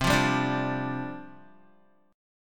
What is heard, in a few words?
C Suspended 2nd Suspended 4th, down, Guitar